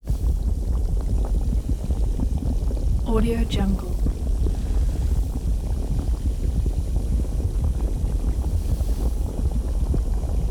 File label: lava presence